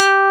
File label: DEEP SYN BS; Pro-Rec - Synthsonic; TRINITY